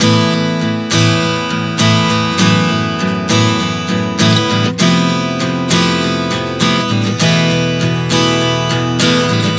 Progression 100 Asus9-F#m11-Fmaj7b5-Esus4, Acoustic Guitar, Chord Progressions 100 bpm